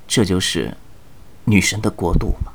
死亡; ycrpg; BattleCry; 年轻的修士

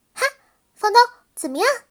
胜利; ycrpg; BattleCry; 莉莉

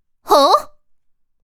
受击, BattleCry; ycrpg

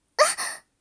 受击; ycrpg; BattleCry; 莉莉

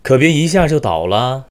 攻击; ycrpg; BattleCry; 南宫三八

